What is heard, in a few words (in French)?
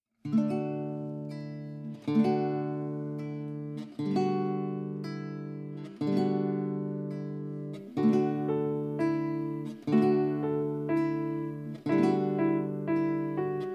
la ronde des mois instrumental guide chant; Comptines